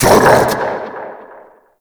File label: Uriel Brimstone Cast Vocal; Warframe